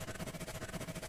roll; Ben 10 - Hero Time Game; cannonbolt

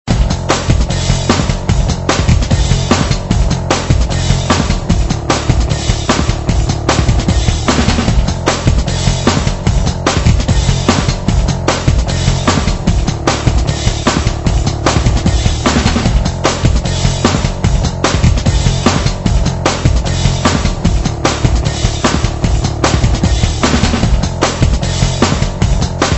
music; Looney Tunes - Avalanche Snowboarding

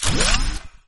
booster collect; Gotham City Speed

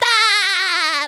idle, Truck; spongebob sticker

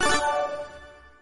streak; Gotham City Speed

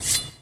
swish; Power Rangers vs Teenage Mutant Ninja Turtles - Ultimate Hero Clash 2

